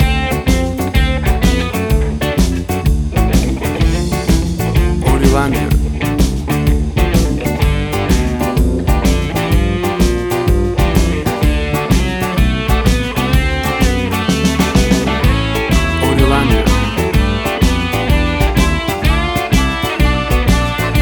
Bluestown Boogie

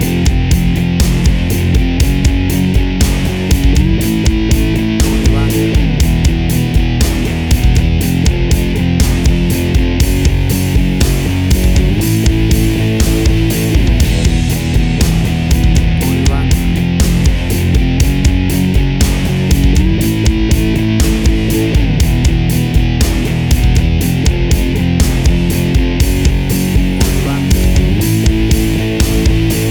Aggressive Rock Music

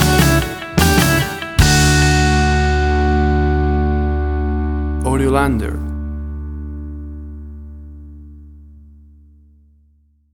1990s Ballad Pop Rock Stinger

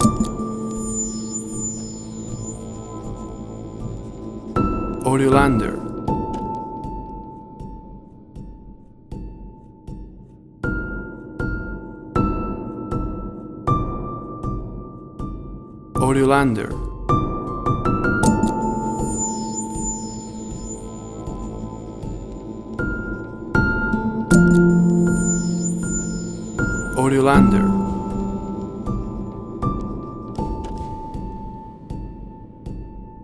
A Dark Piano Theme

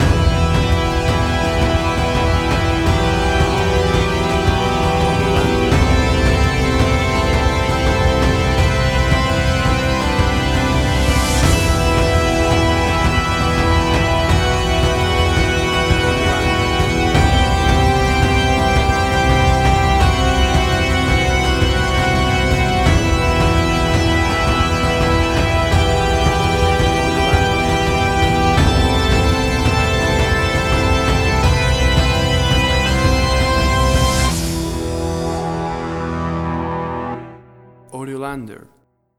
Atmospheric Warm Hybrid Action Track

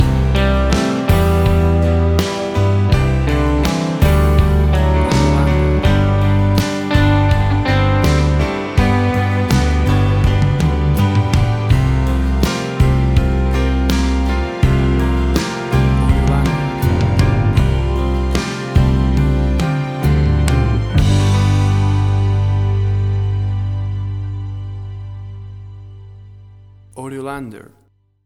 Acoustic Country Soft Rock Ballad Music; 30 SEC

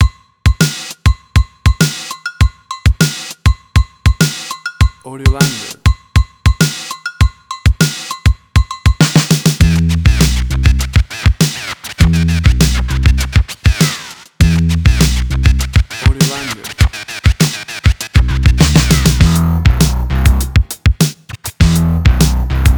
80s Hip Hop; Prospect Avenue

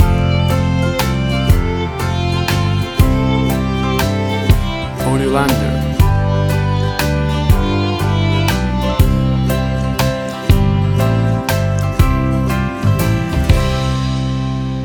Country Waltz Romantic Ballad; 15 SEC

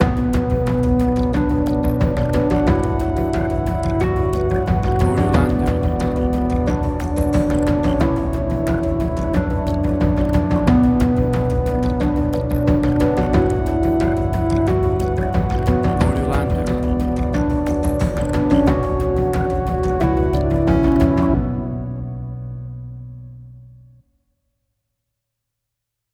Airy Industrial Tension

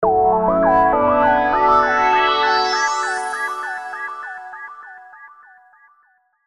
Clock

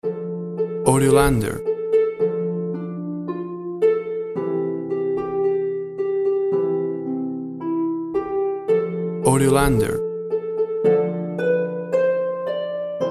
What Shall We Do With The Drunken Sailor Harp; Harp version

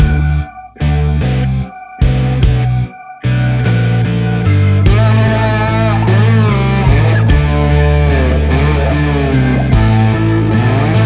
Blues Bar Angst

